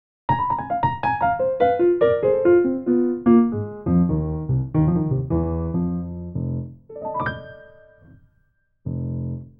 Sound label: Jazz Piano; Childs Intro Jazz